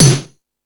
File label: Ambient Rock, Drum Machines